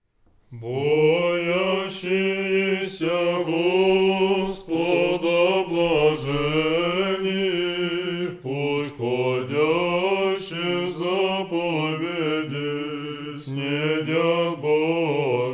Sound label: third antiphon tone 3